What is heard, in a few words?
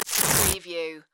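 Masking Tape Rip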